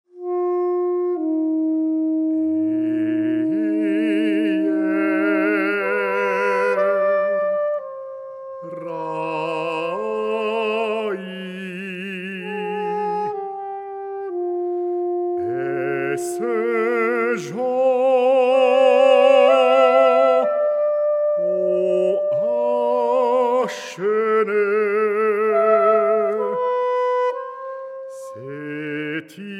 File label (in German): Reiselied